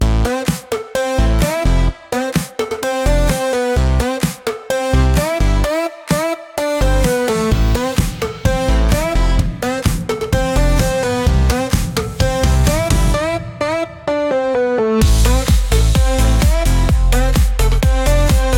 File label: Pop